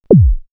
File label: Thud, synthfx; X-Static Goldmine